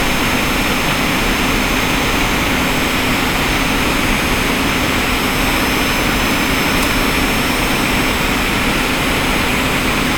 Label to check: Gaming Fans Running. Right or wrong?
Right